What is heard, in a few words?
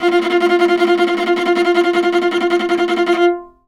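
viola tremolo F3; Orchestral; DirectWave; Varazdin Orchestral; Viola II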